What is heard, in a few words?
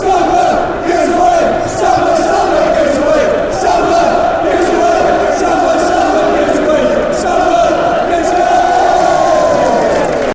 samba give us wave, chant